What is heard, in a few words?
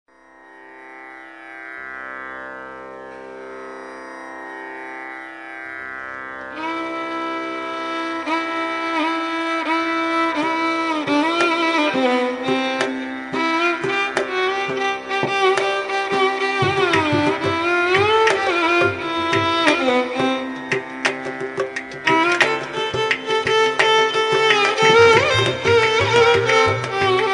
Behag Romantic Violin Rythmic